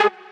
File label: Mainlead Melody